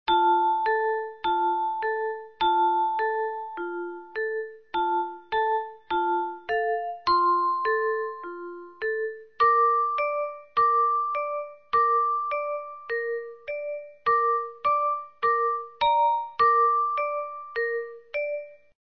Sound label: lullaby; Little Bill's lullaby